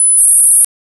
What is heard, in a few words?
dqpsk 2321 baud 10 khz